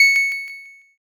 ding

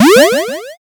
jump

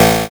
zap

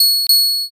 bike bell

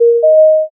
m notify

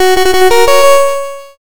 fanfare

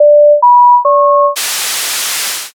modem

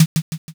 m keytap

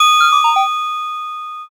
ice magic

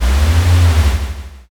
servo

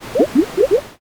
poison

